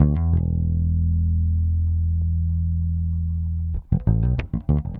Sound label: Bass, 70s Analog Funk; Sonic Foundry (Sony Creative Software); What it Is!; Slinky's Place